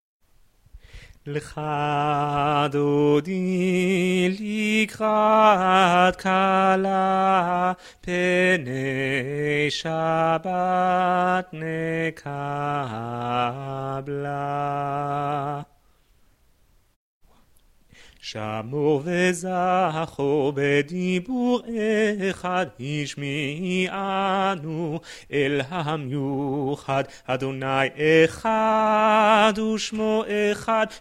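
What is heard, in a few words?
alto; lecha dodi; BCC choir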